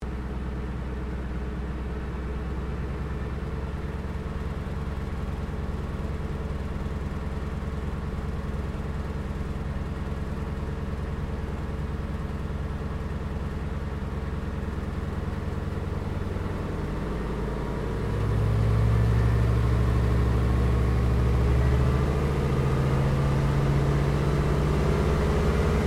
AIRCRAFT CA-13 BOOMERANG TAKEOFF INTERIOR STEREO; Airplanes

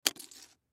Credit Card Toss Slide Across Counter Top; Slides; Blastwave; Foley

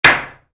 Hammer nail, Hammer: Impacts Nail; Hammers; Tools; Stuart Duffield

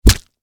Impact Punch Face Meaty Flesh; Punches and Hits; Combat

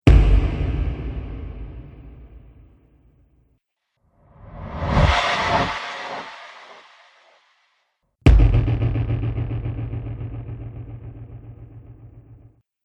Cinematic Drum Hits; Sound Design; Hit Sound Effects

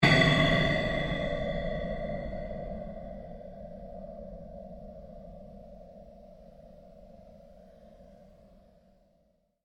Created pulse fade long

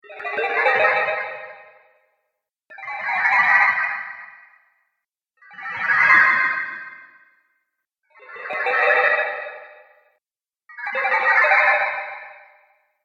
Spell Water Illusions Collection; magic; Magic Sound Effects

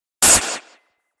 Robot Air Blast; Robot Sound Effects; Sci-Fi Robot Sfx; Andrew Fleming

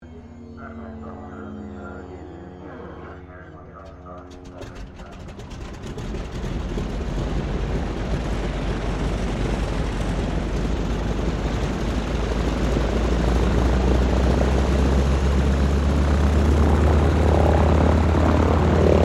AIRCRAFT AT-6D HARVARD MK III STARTUP TAXI; Harvard Training Aricraft; Airplanes; Vehicles

